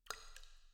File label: spill